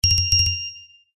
multimedia alert finished taps dark with bright ringing